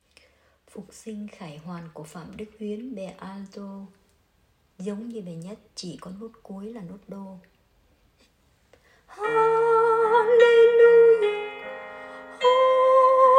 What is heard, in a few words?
Alto; ca doan